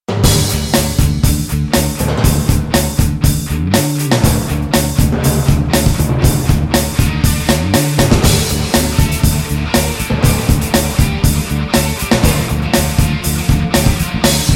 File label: turk guitar